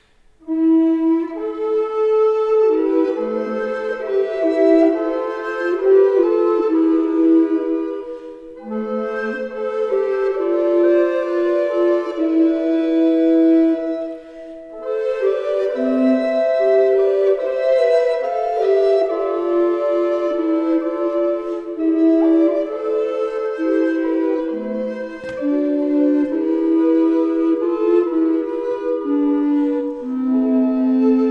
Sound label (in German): Flöten; AK-Maria